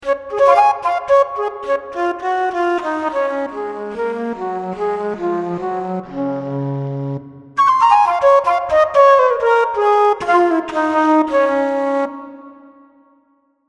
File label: FLUTE